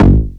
bassdrums, techno; Techno ID; single-drums

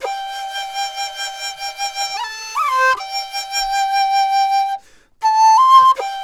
DIZU, 90; INIS - Opium

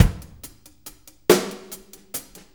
6-8 Loop, Full Kit Loops; Sonic Foundry; Loops & Samples Drum Tools

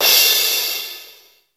DMX Crash, Oberheim; Drums; Oberheim DX

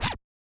Yamaha DJX 2B, Scratch R

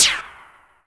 Speedy, cartoon

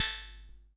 MT36 CYM, Drums; Best Service; Dance Mega Drums

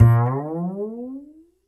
Upright Acoustic Bass; Larry Seyer

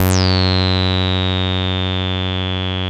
SAWREZO, BASSES; Masterbits - Vintage Voltage; VV BASSES

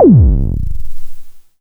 Dirty Mutha, Kick Drums; Zero-G; Soundclash

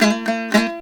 HALAM MELODY; Best Service; World Colours

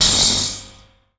SPRING; Rarefaction; Poke in The Ear With A Sharp Stick

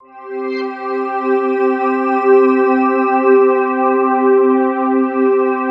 PAD, Drum & Bass, 160 BPM; Ueberschall; Drum & Bass Flash Trackz

